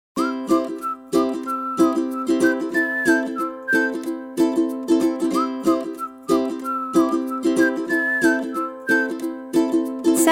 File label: Whistling For Fun